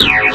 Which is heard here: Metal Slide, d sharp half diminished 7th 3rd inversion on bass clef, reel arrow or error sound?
error sound